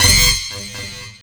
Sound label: FX SWEEP; SYNTH FX; SYNTH SWEEPS